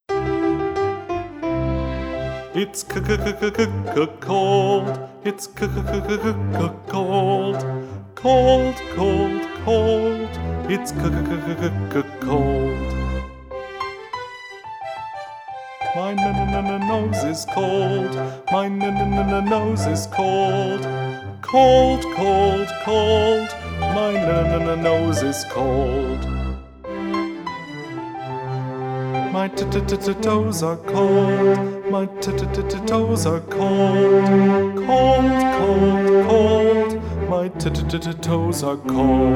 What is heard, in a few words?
vocals bass